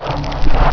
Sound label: alien door